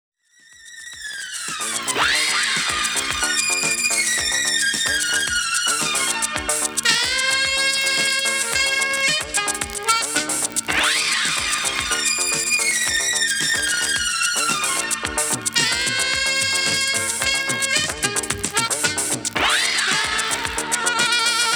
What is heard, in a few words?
SAX